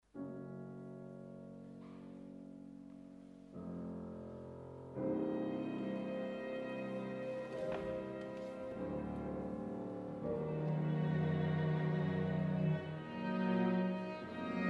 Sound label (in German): klavtrio